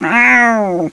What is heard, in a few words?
CAT, 猫; 动物; 家畜; 特酷声音素材库